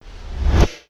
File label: Huffed, Drum & Bass Hits & Bits; Total Drum&Bass; 90 s Sample CDs